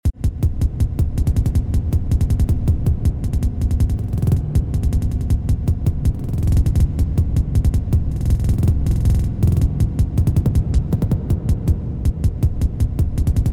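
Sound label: sinchrofazatron morse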